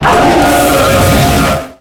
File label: pokemon cry; white